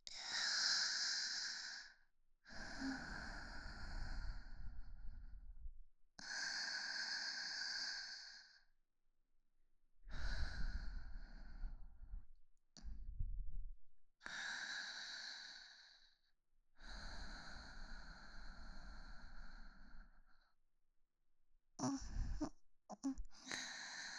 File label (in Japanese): 寝息・彼氏と一緒に穏やかに眠っている彼女; 明るい少女系カノジョ